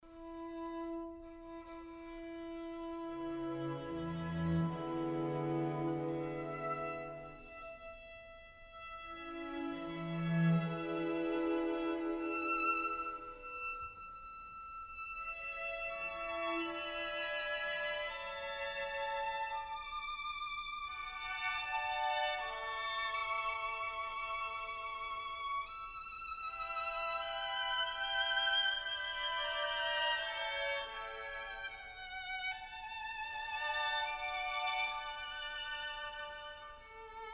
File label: quintet; koessler